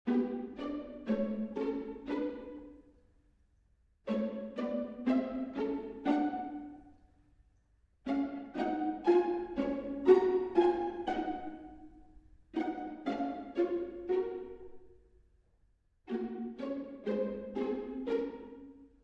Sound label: Strings Only